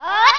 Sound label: jump, elf; xmas; quakemapping